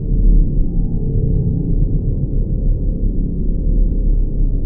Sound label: interior